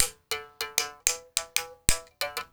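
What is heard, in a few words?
SM MOUTH BOW; Spectrasonics; Heart of Africa 2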